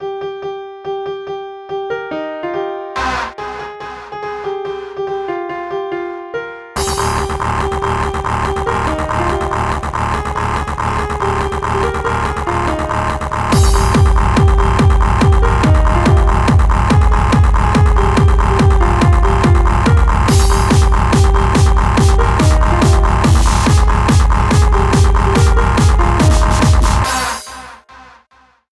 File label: harder xmas (jingle bells)